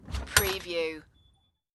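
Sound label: Open Front Door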